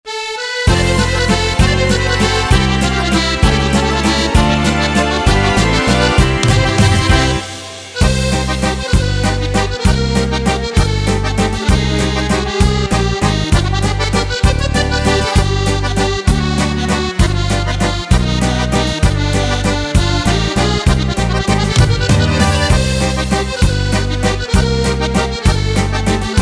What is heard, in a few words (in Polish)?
Cala sala Midi Yamaha; general midi